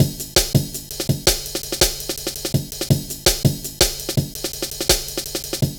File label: Drum Loops, 165.5; Zero-G Jungle Warfare 1, 2 & 3